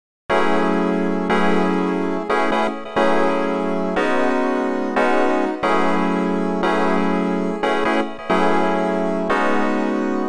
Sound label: electric toothbrush fm